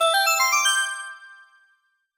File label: 1-Up; Super Mario 3D Land; E3 2011 Beta; 3DS